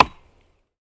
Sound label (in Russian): zvonkij kostyanoj udar